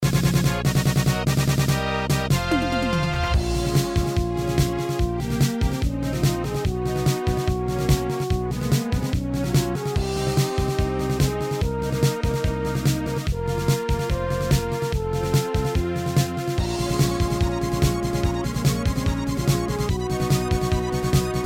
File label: Boss Music